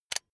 electric button drill direction switch forward heavy